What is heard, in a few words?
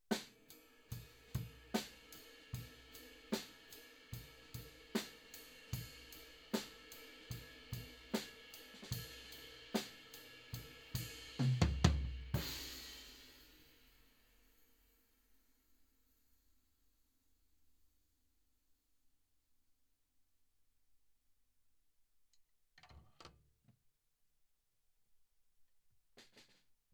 floor